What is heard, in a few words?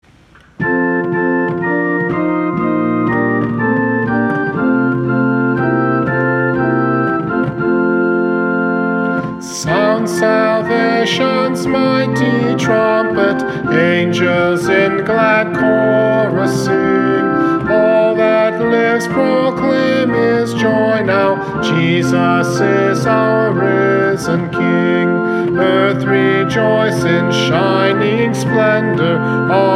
Trumpet; Sound Salvation's Mighty Trumpet